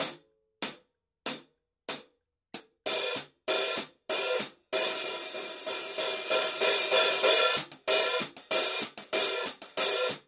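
signature 14 reflector heavy full hi-hat pattern, cymbales; Forgées à partir d’un alliage secret de bronze; paiste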